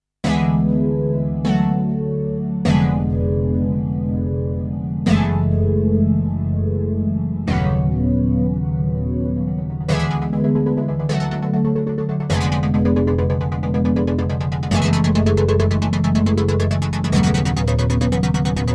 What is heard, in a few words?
ASM Hydrasynth Ribbon Soul